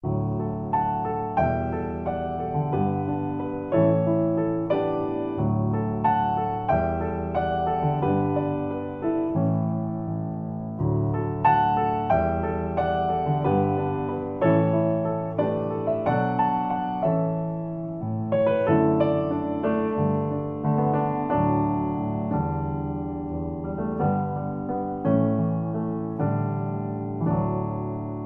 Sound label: Solo Piano